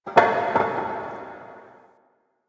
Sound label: Metal Debris; Warframe; Quests; TheNewWar; Ambience; Zariman